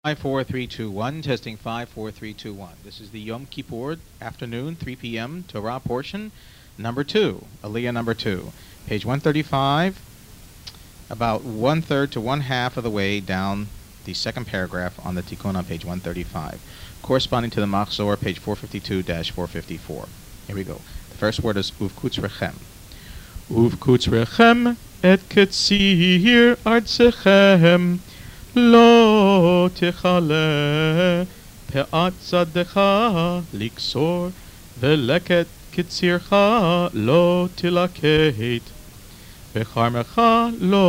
afternoon torah

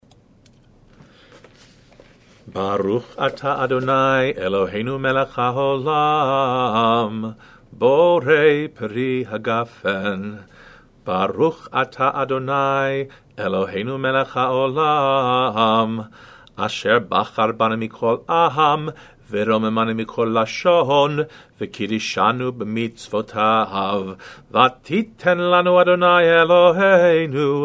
Kiddush for Pesakh

